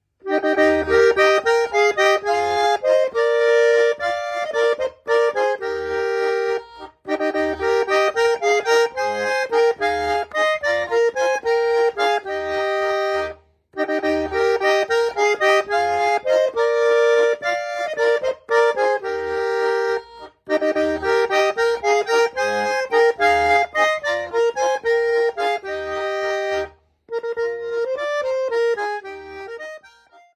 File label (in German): vollmond walzer; oergelinoten